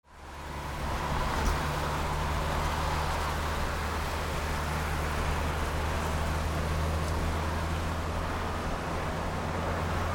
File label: HIGHWAY